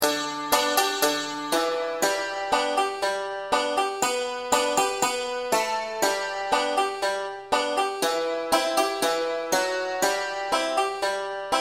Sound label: banjo solo w melody; 5 string; this lil light